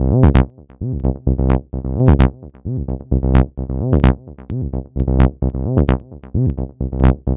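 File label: tb303 acidline, 130 bpm; SYNTH AND ELECTRONIC LOOPS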